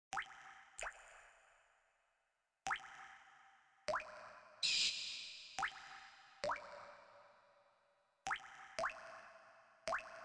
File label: Caves Ambience; Donkey Kong 64; Jungle Japes